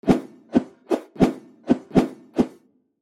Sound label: arrow swooshes multiple